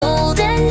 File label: Cruising Convertible Vocaloid